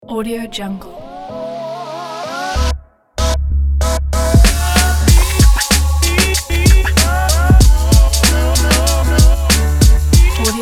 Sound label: hiphop